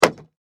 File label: Foley Button Click; SFX Bible 6